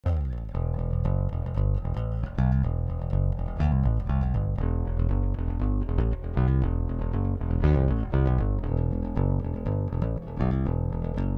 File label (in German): Rock DI Pre Rickenbacker Div Pick Up Positionen